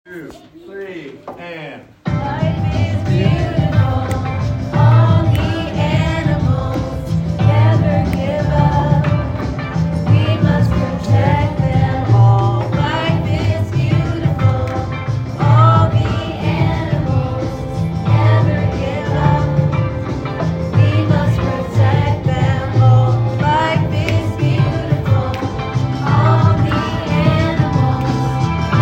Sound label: chorus